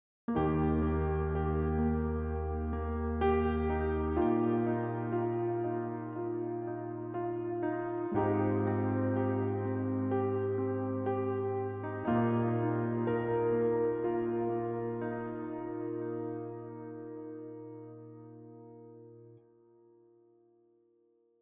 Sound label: Piano; WL Piano Patch